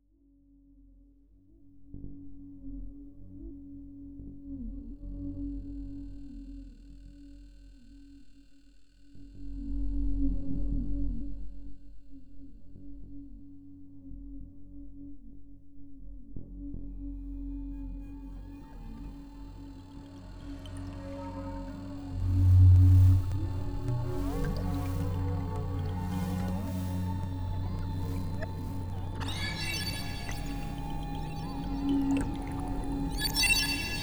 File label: Forest